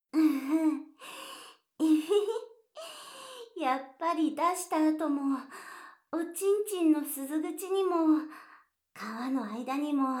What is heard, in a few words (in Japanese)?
バイノーラル フェラパート, ASMR; 青くてオイシイものを、たっぷり口へ……♪